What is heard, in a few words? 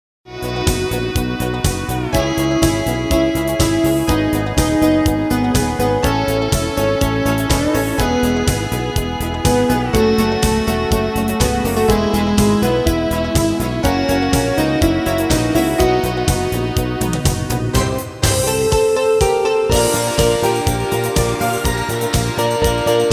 Happy Guitar Orchester